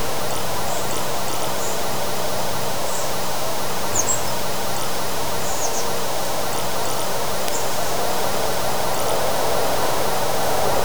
une lgre brise naturelle, open field

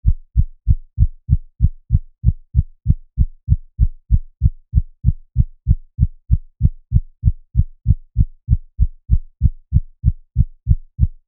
coeur qui bat a 96

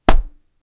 boxeur qui tape un sac, boxing

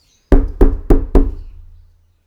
oiseaux heurtant une vitr; accidents

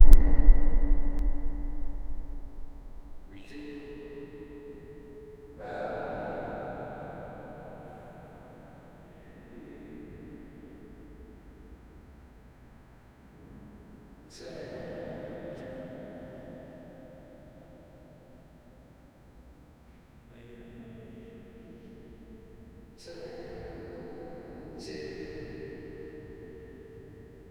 bruit reverberation dans, hallway

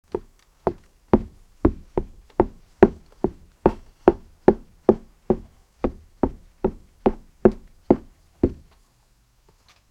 bruit de pas d'homme sur; western

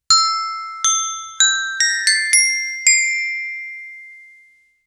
carrillon de type xylopho, school bell

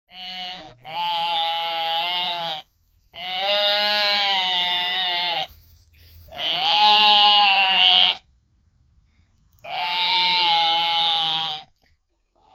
bruit de chevre, baa

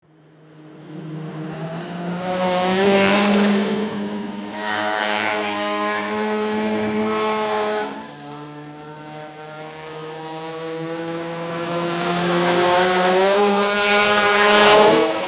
bruit course de plusieurs, racing

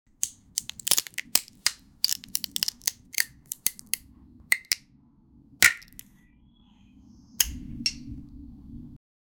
une cannette de monster s, object manipulation

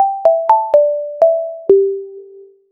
un court jingle un rythme

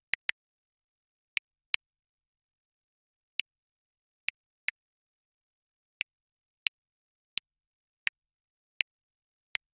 petit clic de bouton lger

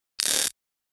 chaine dclaire style cart, electric

